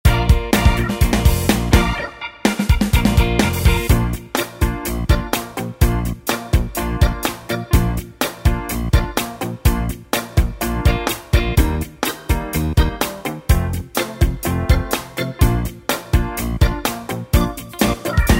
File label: 70s Pop Organ Beat Style